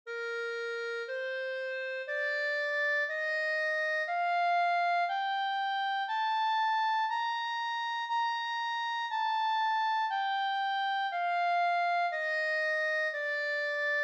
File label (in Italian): Clarinetto in Sib